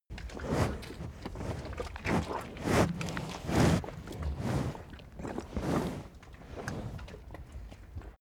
cow eat breath

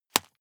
juggle catch hard

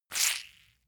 leather impact mud medium squeeze

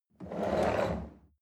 chair wheel roll on floor medium

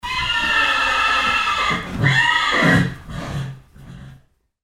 horse running off

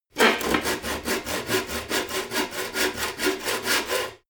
sawing

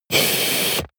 vent air thrust

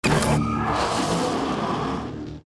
electric door

